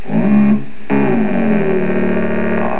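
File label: sting reveal; usa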